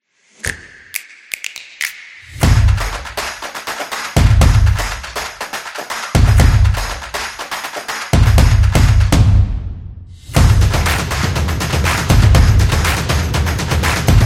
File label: The Drumming Stomp Solo, samba